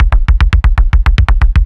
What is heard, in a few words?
707 mod Kick